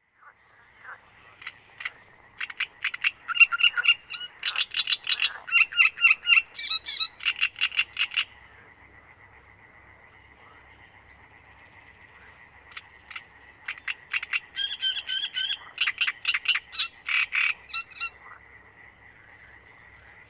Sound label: acraru song, rudbarzi; i.folkmanis